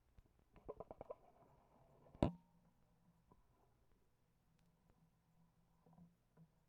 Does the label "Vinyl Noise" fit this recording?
Yes